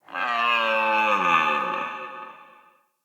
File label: Stag Stampede Vocal; Powers; Stag Pact